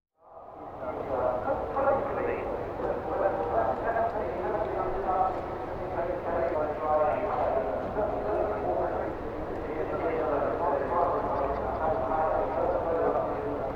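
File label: Outdoor Event, Exterior Atmosphere Ambience With People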